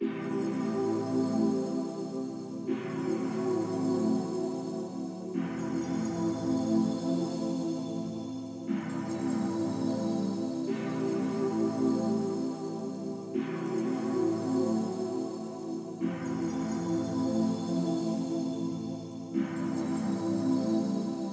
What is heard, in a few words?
Chillout Pad 8 Bars; vostok inc. switch gamerip 2017